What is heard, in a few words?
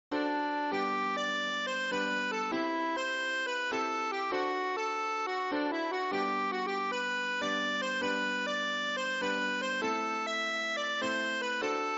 hambo bodapolska